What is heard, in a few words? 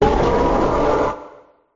cry